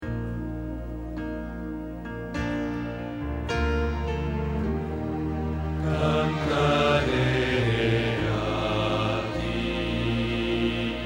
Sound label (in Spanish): Bajo